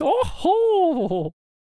Chariot Attack Vocals

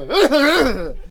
Cannon Attack Vocals

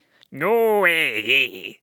Ice Mage Attack Vocals

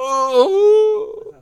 Healer Attack Vocals

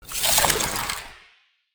Ice Mage Shoot

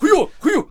Hwacha Attack Vocals

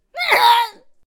Dragon Carrier Death Vocals

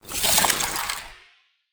Ice Shoot

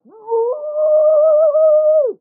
Executioner Attack Vocals

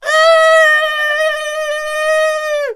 Bomb Cannon Attack Vocals

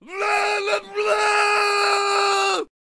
Brawler Attack Vocals

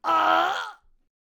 Death Vocals

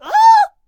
Attack Vocals; Halfling

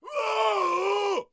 Jarl Attack Vocals

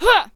Bomb Thrower Attack Vocals

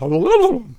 Bard Attack Vocals

